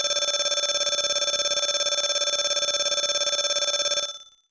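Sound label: Alarm Call DX100 A#5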